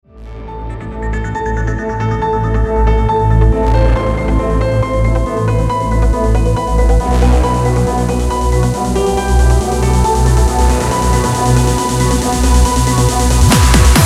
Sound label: Trance Rise Uplifting